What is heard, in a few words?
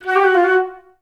FL ORNAMI F#3; Best Service; Peter Siedlaczek's Advanced Orchestra